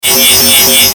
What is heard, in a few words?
DIGITAL ALARM, Digital Alarm Buzz Static; Digital Sound Effects; Production Element Imaging Element Accent Transition